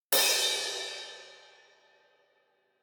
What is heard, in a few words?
cymbal; 効果音